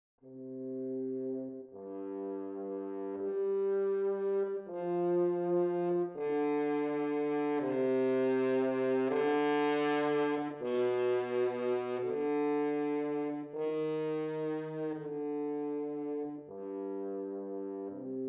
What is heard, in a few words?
Horn